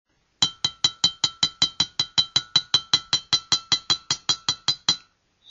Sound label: Normal ceramic cup with hot water only